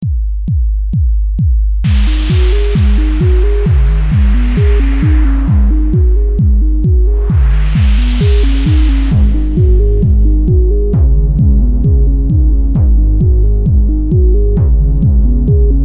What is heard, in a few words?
csynth